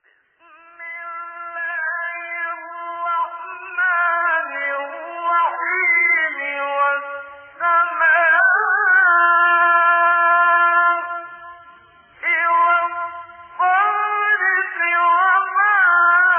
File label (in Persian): تلاوت سوره مبارکه طارق; استاد منشاوی